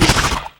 quill cast; weapons; dota; bristlebog

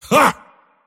mars laugh; dota

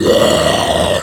death troll warlord; dota; creep deaths

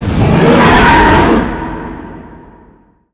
overpower; ursa

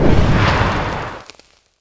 blink in layer; weapons; dota; queenofpain

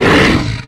death centaur outrunner, creep deaths; dota

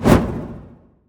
preattack; weapons; dota; ember spirit

